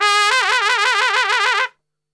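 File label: G# trumpet shake; Best Service; Brass Super Section; TRP SHAKE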